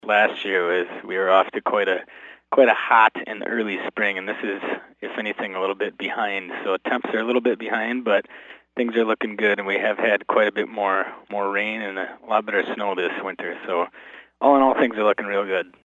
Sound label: bass opener